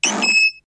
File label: Message Tone